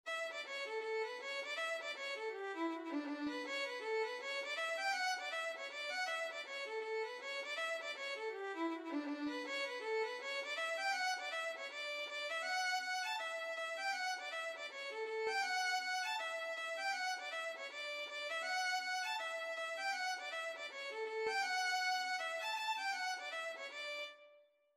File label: ready now, violin